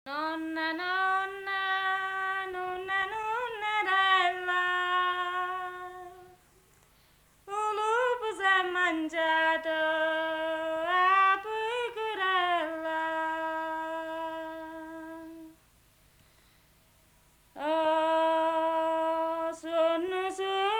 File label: Lullaby; campania